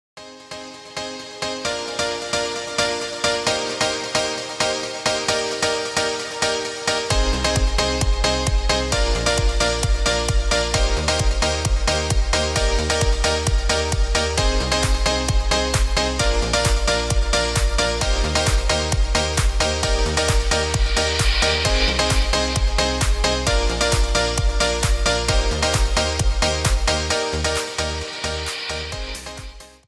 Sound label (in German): musik hoerprobe